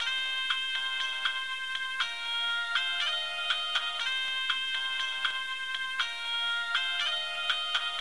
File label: laid back ringtone; gta iv